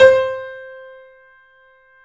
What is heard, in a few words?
The Piano, C; InVision Interactive; BOES PLAY HARD